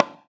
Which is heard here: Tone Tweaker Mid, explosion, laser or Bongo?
Bongo